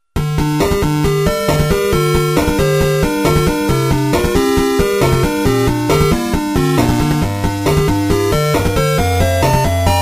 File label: Irrational Chiptune